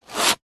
industrial sanding large piece of wood sand paper single